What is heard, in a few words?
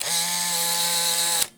Camera